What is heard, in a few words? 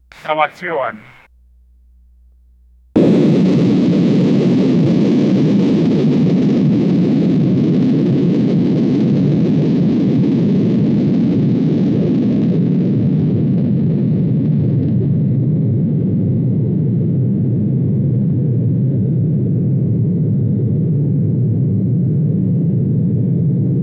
series of hollow tube launch